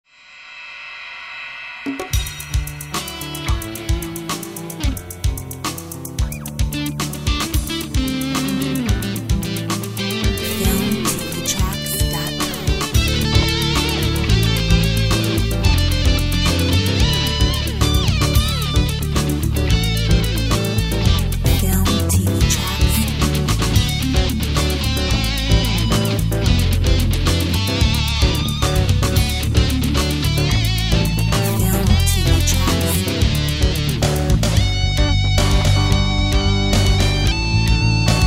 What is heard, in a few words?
Rock Fusion; Filmtv-tracks